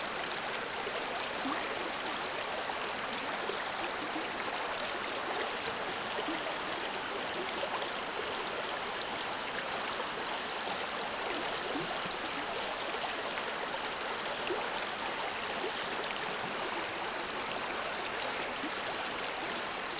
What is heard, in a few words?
ambience water river